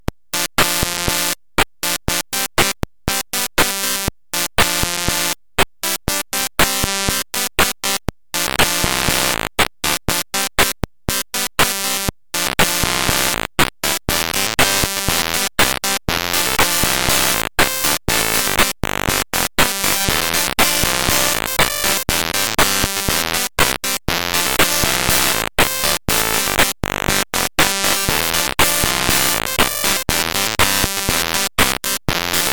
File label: zx beeper